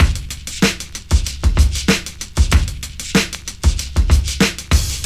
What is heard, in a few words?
95 bpm 2000s breakbeat d sharp key, Free drum loop - kick tuned to the D# note.